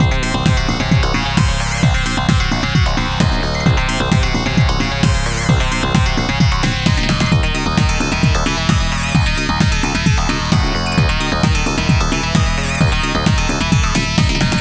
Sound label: vibrants techno